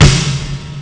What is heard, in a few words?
ex akai snare; Amiga Soundtracker Sample Packs